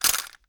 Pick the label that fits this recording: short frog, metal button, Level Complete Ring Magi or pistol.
pistol